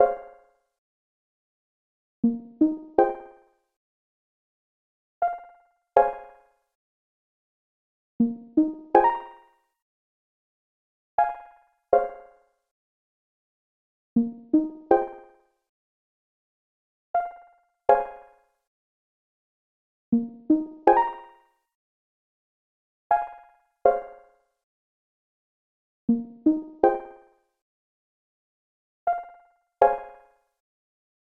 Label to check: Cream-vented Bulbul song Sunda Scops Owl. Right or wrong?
Wrong